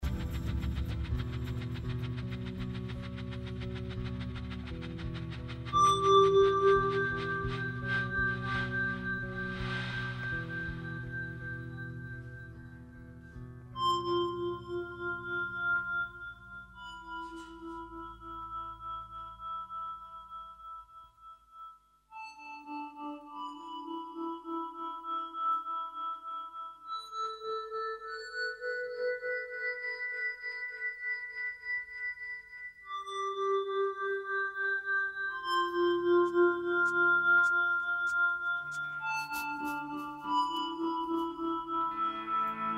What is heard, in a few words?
Dead Man Whistling; Wah Wah